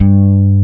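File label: G1 Telecaster, guitar; AKAI S-Series CD-ROM Sound Library VOL-8; SET#1 GUITAR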